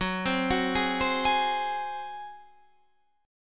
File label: Gb Suspended 2nd Flat 5th, Guitar, Standard; arpeggiated; 14,15,16,13,13,16